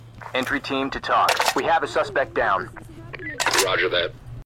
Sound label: Police Control Room Ringtone